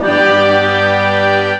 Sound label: orc e3, Orchestra, AKAI S-612 + S-900